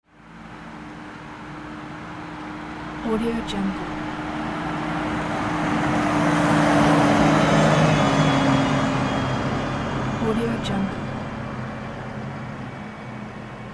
bus in the city passing right to left

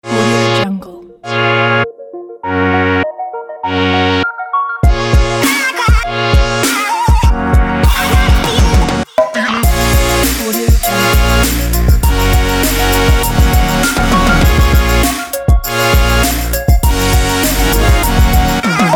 sports action future bass

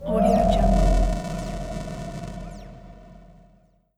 dark scifi transition

